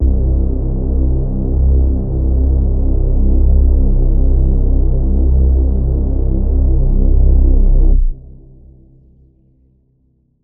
Synth Bass C, Trap One Shot; HipHop; Melody Kits; Ghosthack; AC2024 - Day 5

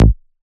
Ashes Bass A#

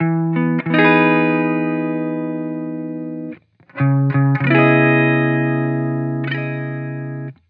Vibrations Chill Guitar Loop 128 BPM E Maj; Cymatics; Vibrations Guitar Sample Pack

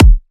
Riddim Kick

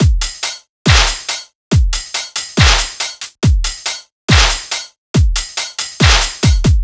Drum Full 140BPM, dubstep; Ghosthack; AC2024 - Day 13 - Dubstep Sounds 2025

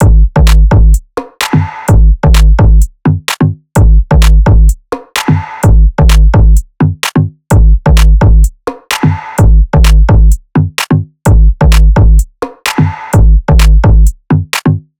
Drum Loop 128 BPM, Experimental; Abnormal; Cymatics; GHOST (Beta Pack)

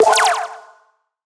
Simple Gravity Switch FX Am6; Video Game; Cymatics; Video Game Sample Pack Vol 1

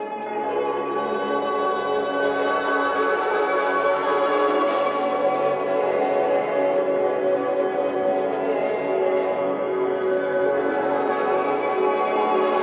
Tonal Swarm, 76 BPM F# Min; FX; Angels

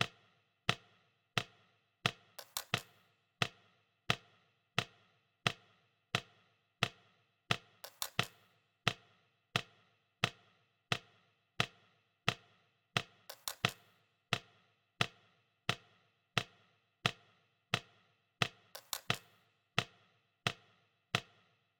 Drum Loop 88 BPM Percussion; Drum Loops; Loop Stems; Cymatics; Mystery Pack Vol 4; Patience Drum Loop

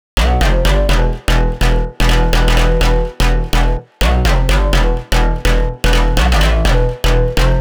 House Drop Loop, Future House, 125 BPM E Min; Synths

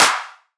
Riddim Clap

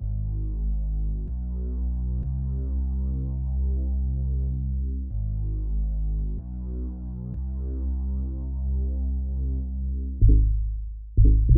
94 BPM C Min Bass, Hip Hop; Melody Loops; Loop Stems; Cymatics; Optical

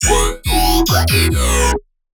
Melodic Bass E 140, One-Shots Bass Hits; Promise; Ghosthack; Color Bass Essentials

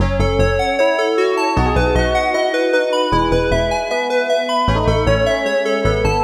Happy Melody Loop 154 BPM E Maj; Cymatics; Oracle Sample Pack

